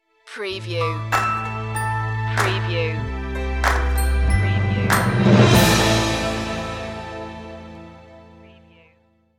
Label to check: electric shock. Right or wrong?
Wrong